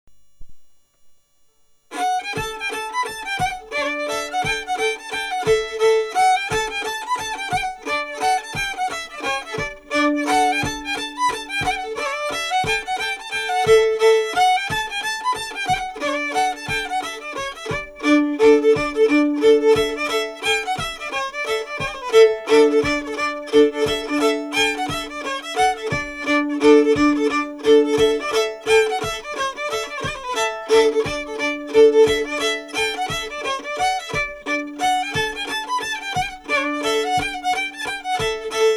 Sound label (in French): Mazurka; d'après L Peyrat